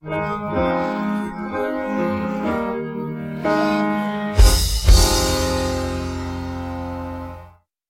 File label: instrumental